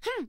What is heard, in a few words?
move side; Talking Tom Gold Run